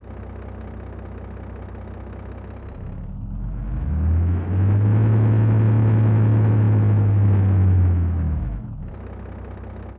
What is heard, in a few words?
truck modern ext, engine sound